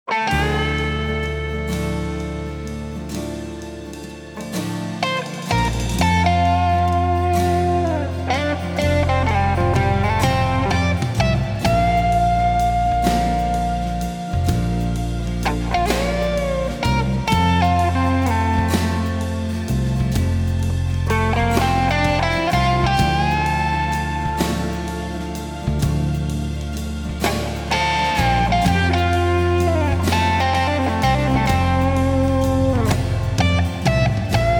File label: Blue Guitar